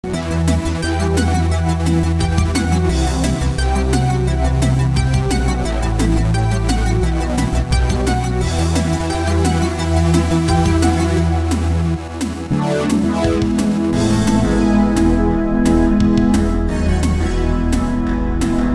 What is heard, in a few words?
Analogue